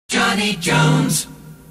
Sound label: jock jingles; pams series 42a; johnny jones